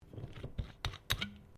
Bottle Glass Cap Metal Screw Off; Various bottles; Materials

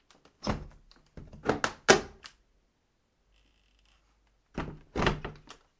household door hatch wooden open close; Hatch Style Door ( Wooden ) Opened Then Closed; Miscellaneous Household; McKinney Sound

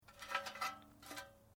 Metal Light Movement Minor

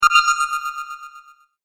Generic Bonus Or Pickup, video game

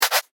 Swat Double Hitter, cartoon sound; Miscellaneous Cartoon Sound Effects; impact

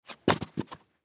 Stiletto Heels Drop, Stiletto Heels Drop Carpet; Shoe Sound Effects; Objects; Foley

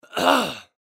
Human Cough; Clear Throat Element Male 25 Yrs; Human Clear Throat Element Male 25 Year Old; Clearing Throat; Blastwave

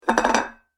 Medium Side Plate Dropped On Table; Dishware Sound Effects; Household

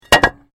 Brick Medium Hollow Fall; Material components for constructing more complex sounds

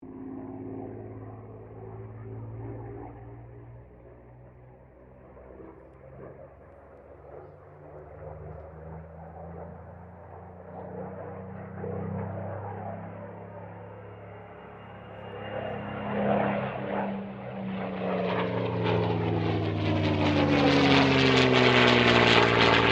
AIRCRAFT SUPERMARINE SPITFIRE MK-XVI LOOP; Airplanes (Sound Effects:Vehicles)

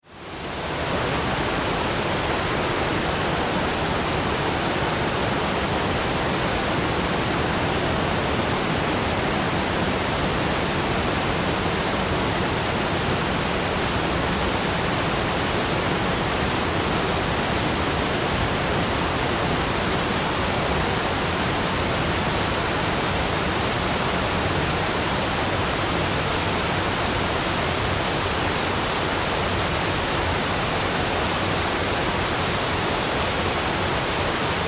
Water Waterfall; Nature

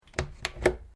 Window Aluminium Sliding Small Catch Unlock; Windows (Sound Effects:Household)

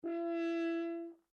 HORN E5 MF LONG STOPPED, French Horn in F; Alexander French Horn in F; Sound Effects:Musical; Foundation3

